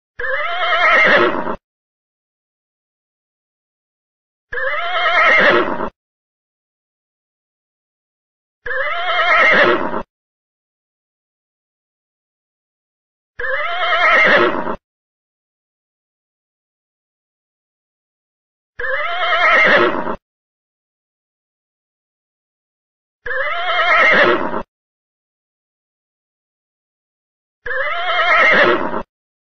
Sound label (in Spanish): caballo relinchando, efectos de sonido; efectos especiales